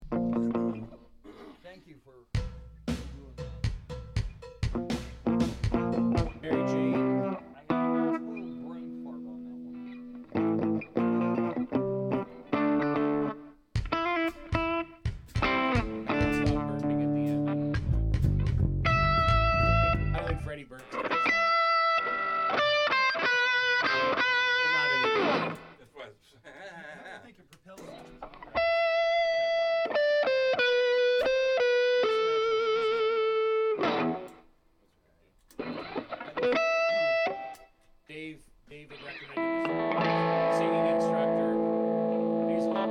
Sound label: Strings Only